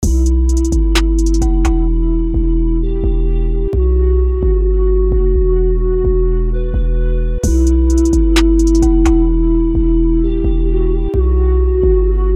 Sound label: beat flute, type beat